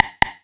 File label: 909 room side, drum machines